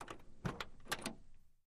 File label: Camaro Door Open Ligh, chevy camaro